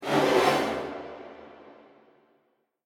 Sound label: Enemy See; Granny 3; Android